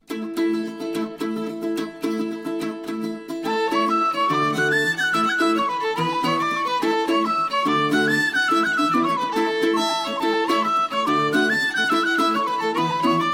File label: Slides & Polka